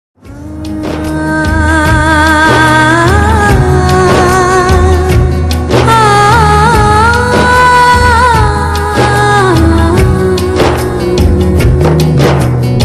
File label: Female Sad Version; Tv Serials; Star Plus; Maryada